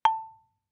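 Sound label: Marimba yarn fortissimo A4; MIS Pitches - 2014; Percussion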